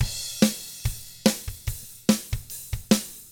ROCK, Drums; ILIO; Double Platinum Drums 1

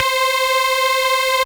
C Synth, One Shots

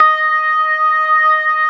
TARK, organ combos

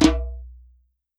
WORLD DRUMS MIXED DRUMS; World Instruments 2; PS MIXED DRUMS

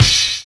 CONGALIGH; Univers Sons - Kitch 70s

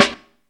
baby grace, snares; Zero-G - Funky Elements

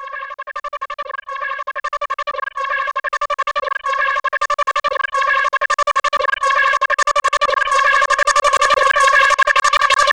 Marching Reversed; AMG - Prototype Drum & Bass

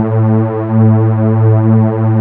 PAD WARM, Nord

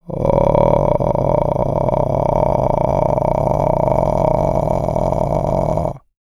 GROWL, VOCAL FX

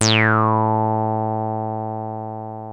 303 A2, TB 303; Hollywood Edge; Giorgio Moroder Rare Synthesizer Collection

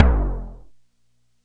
JUNO TOM, Elec. Percussion; E-MU Producer Series Vol. 2; More Studio Essentials; Composer

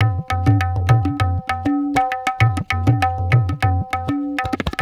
Tabla

